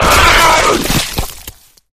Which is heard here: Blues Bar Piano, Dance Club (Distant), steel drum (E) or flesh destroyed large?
flesh destroyed large